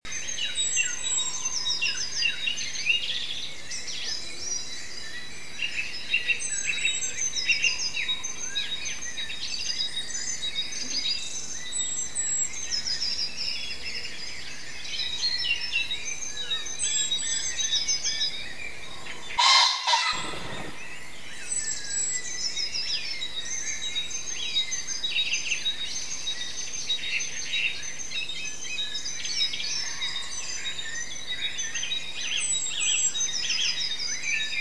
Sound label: dawn chorus